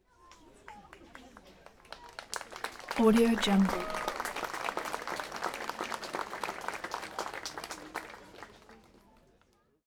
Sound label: parade applause; collanderson